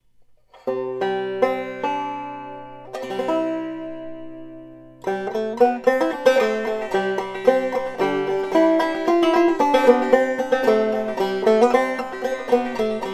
Bright yet with sweetness. Great sustain, great balance and power Wonderful clawhammer banjo; three finger-style potential